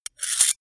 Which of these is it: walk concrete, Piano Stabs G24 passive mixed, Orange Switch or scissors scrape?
scissors scrape